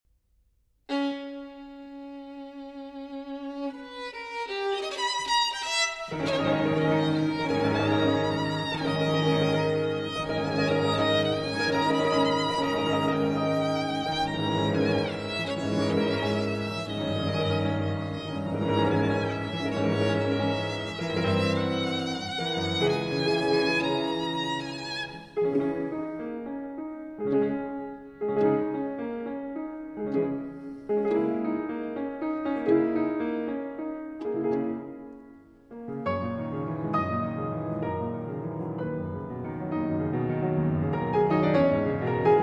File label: Violin Sonata in A Flat Minor, Con Moto